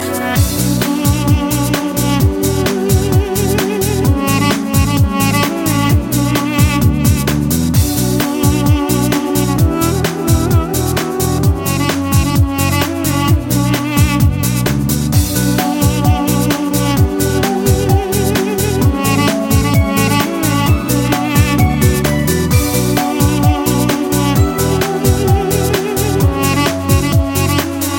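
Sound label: armenian duduk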